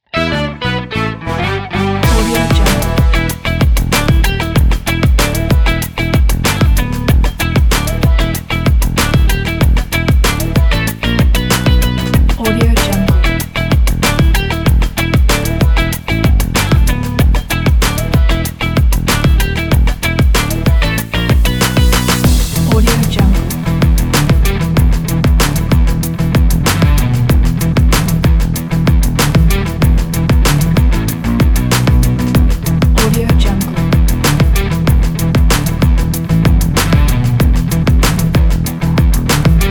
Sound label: upbeat funky